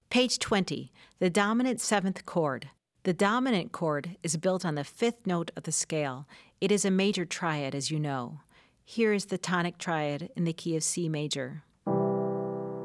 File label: The Dominant Seventh V7 Chord